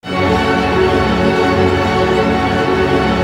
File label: STR ARP MI, Symphony Orchestra, STR Arpegg. Minor; Roland LCDP08 Symphony Orchestra; STR Arpeggios